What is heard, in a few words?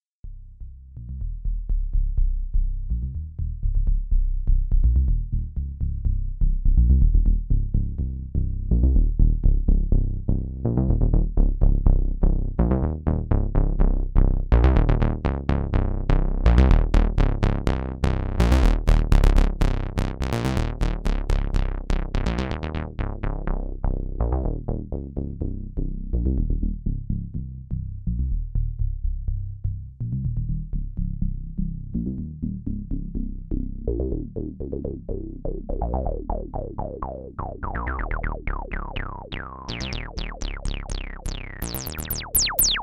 Behringer Kobol Kobolds Bass